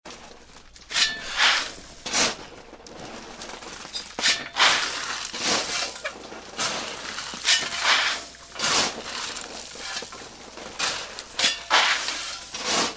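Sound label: shovel sounds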